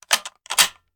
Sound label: Rifle Foley; Sound Ideas; Guns